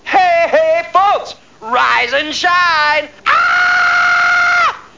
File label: ahh, vocals; Zoom - Release 2 (1996); Active Software